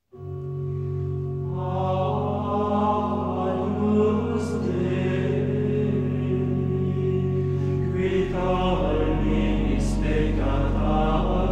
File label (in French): Schola grégorienne, Agnus Dei; Schola grégorienne de l'université de Strasbourg; Messe des anges